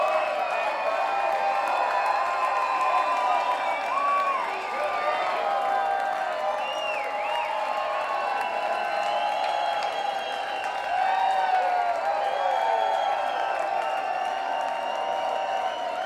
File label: Crowd Noise